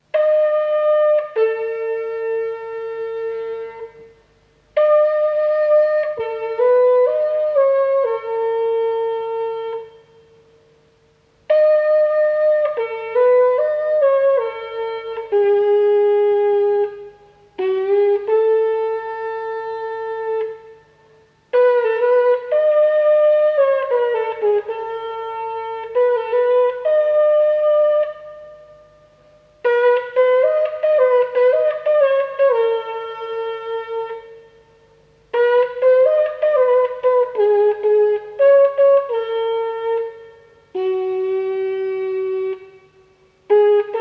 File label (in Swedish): Vallåt; gagnefs minnesstuga